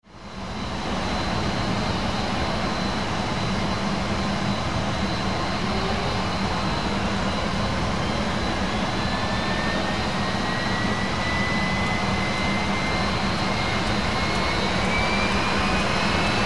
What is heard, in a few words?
learjet45 startup